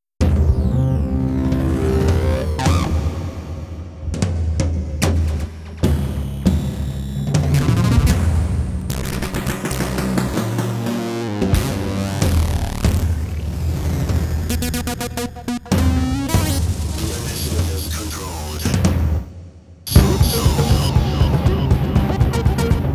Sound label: Acid Timpani; TEKKEN 4 (Original Soundtrack); TEKKEN Project